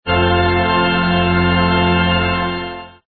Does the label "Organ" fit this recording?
Yes